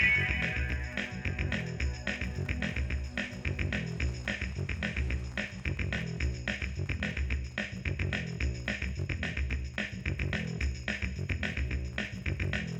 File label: Kharidian music box; RuneScape 3